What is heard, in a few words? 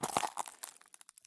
turn glass; Escape from Tarkov